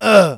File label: pain, male; npc